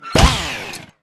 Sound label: lumberjack shoot Saw; inferno